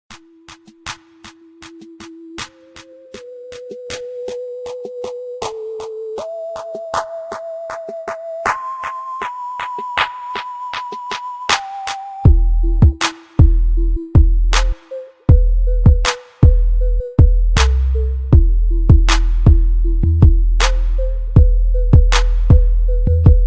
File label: base hiphop